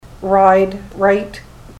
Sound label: ride right